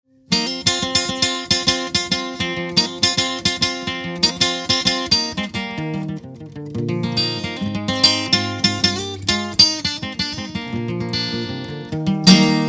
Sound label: Instrumental